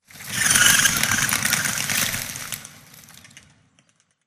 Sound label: swampland bird flock; Titanfall 2